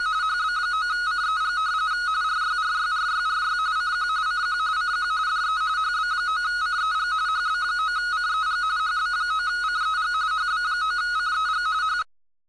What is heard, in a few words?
rtty, cbfunk